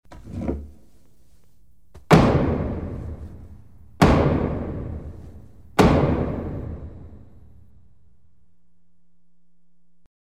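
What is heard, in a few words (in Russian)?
берет и удары судьба; Звук молотка судьи в зале суда; Есть звуки суда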